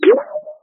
cartoon star shooter

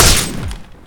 Gun Shot Heavy

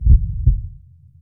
Heartbeat Timbre S Variant Loop

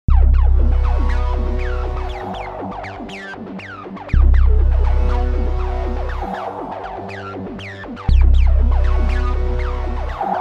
Alien Breathing Groove

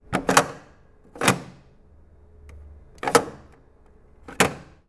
Atm Envelope Tray

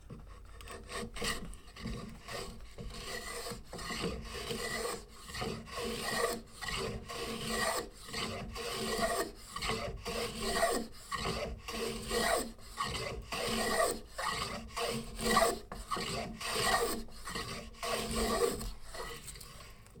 Carpentry Planing Timber By Hand

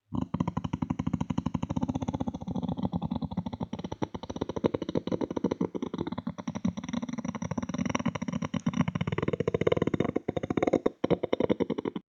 Creature Growl Beatbox; Beatboxfx

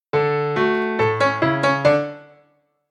Breakfast Piano Jingle

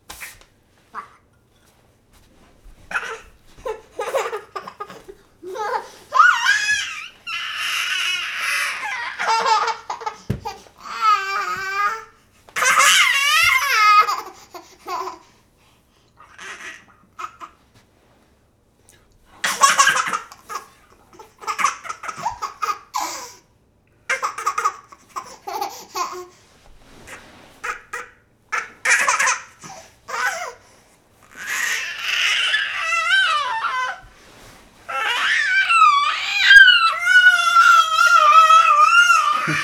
Toddler laughing

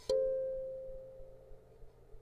Kalimba C4 Wipe Soft

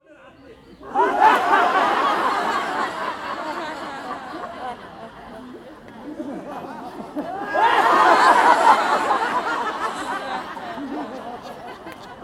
Crowd Laughing

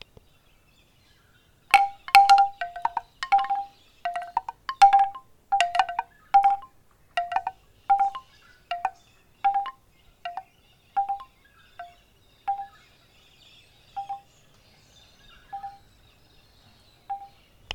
Bamboo Windchimes

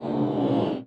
Stretch

